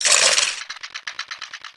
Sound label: windmill